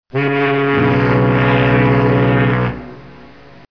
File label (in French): sirene bateau